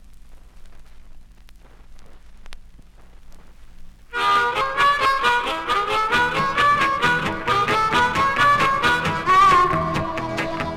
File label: harmonica train